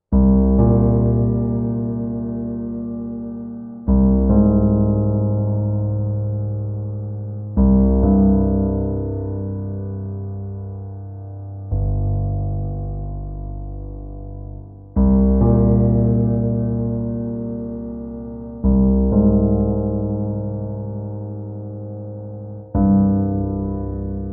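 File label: 65 bpm, piano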